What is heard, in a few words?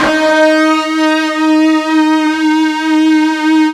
FUZZ D#3, Guitar; East West; Steve Stevens Guitar Samples Collection